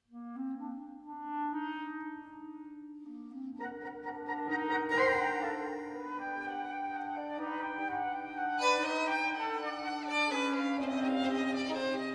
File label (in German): mahler symphonie nr 4 g-dur satz 1